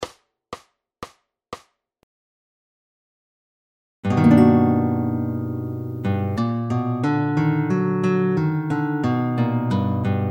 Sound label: Symmetrical Augmented